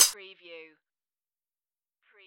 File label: metallic